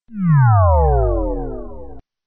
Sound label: synthesizer